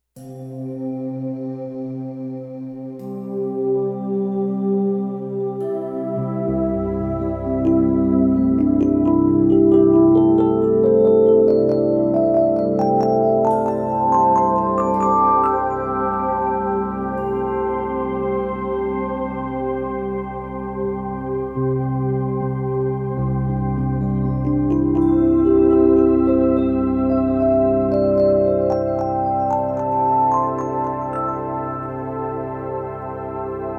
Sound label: music yoga